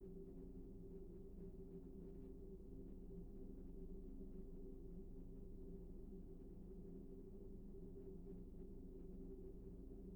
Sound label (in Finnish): Ilmakierto Loop